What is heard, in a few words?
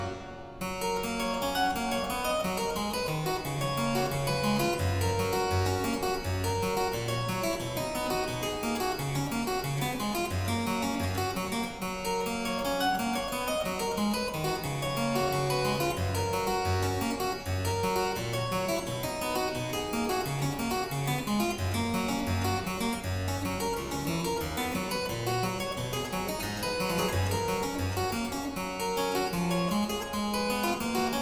Aria Variations in G Major, Harpsichord; La Capricciosa; BuxWV 250; Buxtehude; Opera Omnia; Harpsichord Works